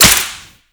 Whip Impact Default; Warframe; Grineer

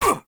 Voice Enemy Hit Standard Noise

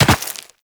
Land Rock, Footsteps; Warframe; Tenno

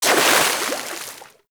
Water Sprayer Shot; Warframe; Weapons

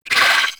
Vocal Pain; Warframe; Moa Satyr

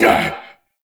Pain Grunt; Stalker; Warframe; Jade Shadows

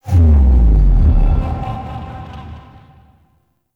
Tower Charge Fail; Warframe; Bard Quest; Orokin Tower

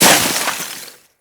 Melee Impact Snow, Weapons

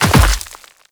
Land Dirt; Warframe; The New War; Kahl; Footsteps

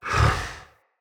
Camel Idle Breath; Khaban Camel Vendor; Vocalizations

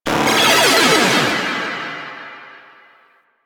Arcade Fail; Nineteeen Ninety Nine; Snake Game

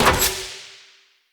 Battle Dog Helmet Destroy; Snc Battle Dog; Foley

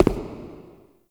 Shadow Figure Steps; Warframe; The New War; Characters; Zariman Shadow

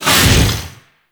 Melee Whoosh; Warframe; Sentients; Erra

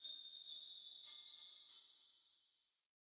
alley distant chains; Ambience; Under City

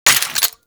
Shotgun Low Ammo Mech; Warframe; Tenno; Alchemist

